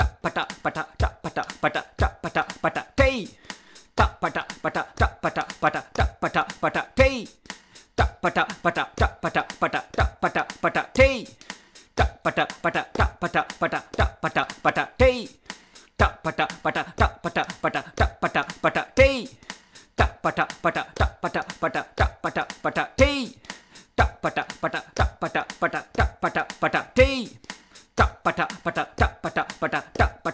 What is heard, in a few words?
ti bwa